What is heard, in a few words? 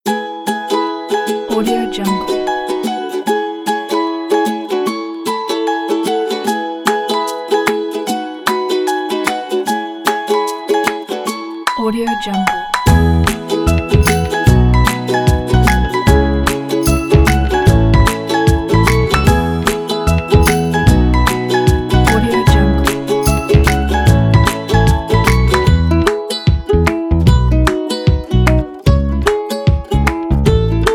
children positive pack